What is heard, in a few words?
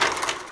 stop; Microsoft 3D Movie Maker